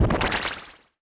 cure poison

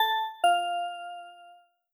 correct answer

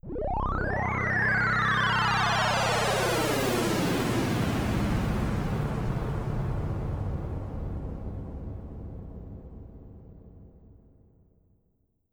cosmic space low

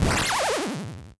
paralyze

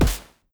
punch lowbit light

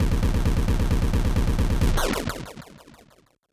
explosion lowbit many

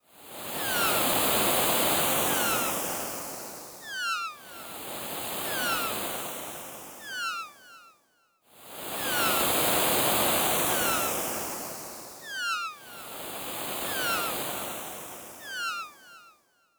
breaking wave lowbit bird cry loop

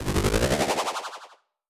strengthen

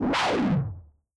beast roar distorted

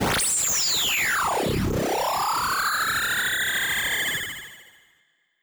strengthen

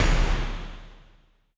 robot footstep long

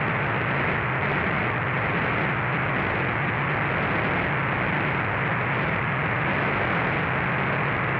synth noise loop

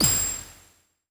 ice beam high

